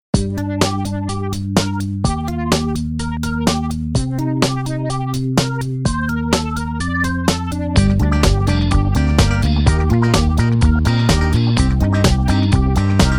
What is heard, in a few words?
h groove